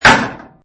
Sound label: Shield Impact